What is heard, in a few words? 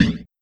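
HH, hi-hat; Sonic Foundry (Sony Creative Software); Esoterik Beatz; Cricket; Criket Kit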